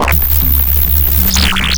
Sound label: ACID NOISE; Big Beat Samples & More; AKAI